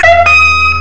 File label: horn; medusa; Amiga Soundtracker Sample Packs